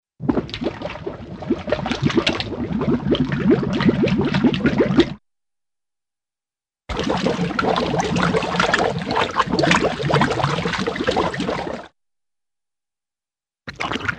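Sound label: Large Bubbles Rise To Surface with 'bloop' Sound; Cartoon; Hollywood Edge; Cartoon Trax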